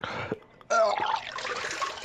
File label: vomit